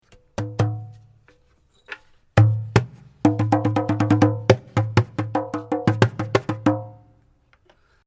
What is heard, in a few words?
membranophone